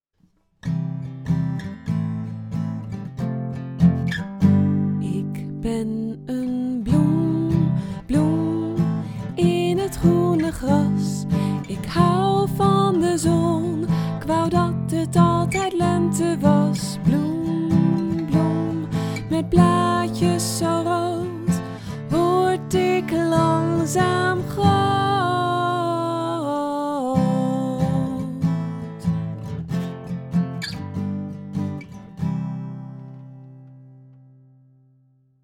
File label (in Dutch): het bloem lied; Geluid en muziek maken bij een korte animatiefilm; Filmsounds; De film gaat over de aarde